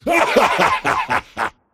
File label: dismember laugh; dota; pudge